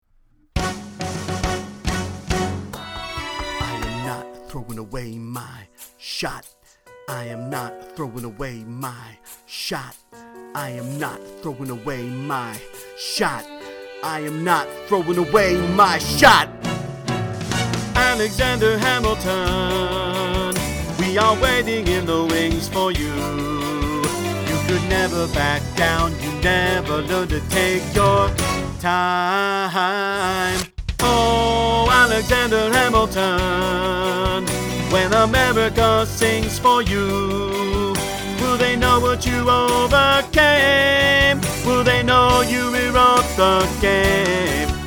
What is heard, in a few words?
bass